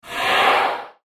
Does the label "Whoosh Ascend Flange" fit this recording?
Yes